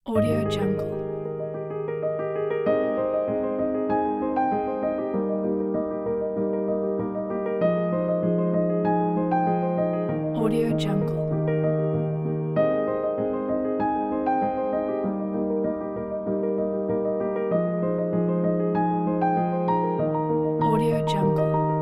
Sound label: emotional light piano